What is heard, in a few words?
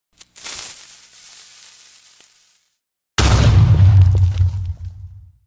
minecraft creeper sound sssssss boom